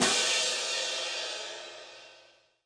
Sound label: piatti orch cymbal; Cymbals; single hits